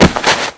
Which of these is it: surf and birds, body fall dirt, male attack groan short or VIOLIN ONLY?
body fall dirt